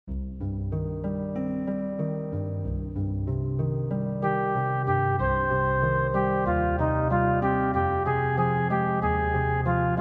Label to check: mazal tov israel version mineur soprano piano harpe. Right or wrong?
Right